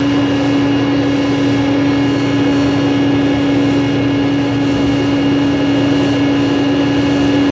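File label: Motor Heavy Whine Hum, idle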